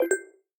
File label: Charging Started; Sony Xperia XA2